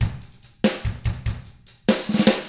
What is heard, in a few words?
ROCK 6-8, Drums; ILIO; Double Platinum Drums 1